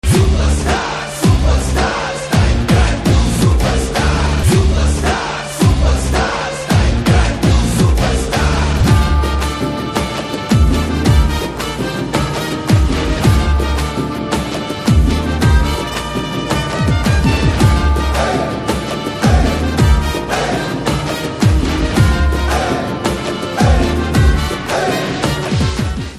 Anthem Theme Music

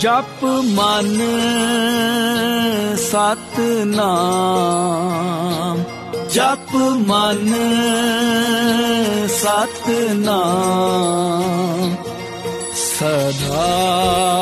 Gurbani Ringtone

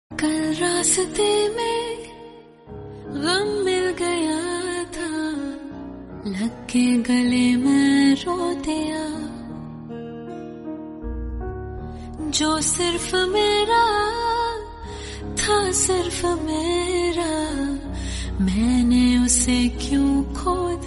Female Version Mood Off Tone

